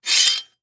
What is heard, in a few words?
Weapon Broad Sword Scrape On Stone; AdobeCC